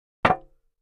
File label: Aluminum Pan Single; barrels buckets & cans